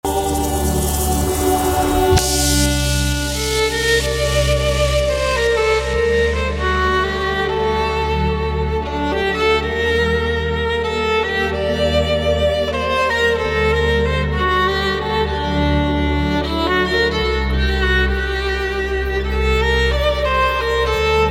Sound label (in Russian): klassika pianino